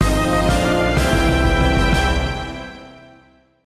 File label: Victory Fanfare; R-Type Command; R-Type Tactics; PlayStation Portable